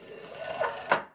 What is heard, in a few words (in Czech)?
otevirani trez; Mafie 1